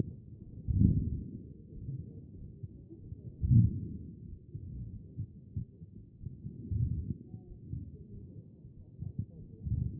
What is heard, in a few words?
A CHEST; B MODE